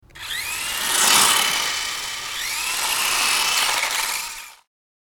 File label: RC toy car remote controlled passing by fast; rc toys